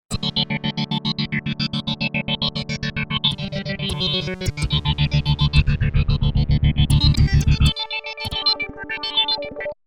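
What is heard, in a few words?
Lead Organic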